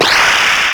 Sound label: Scream